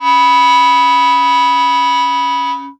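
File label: D sharp 4 with C sharp 6, clarinet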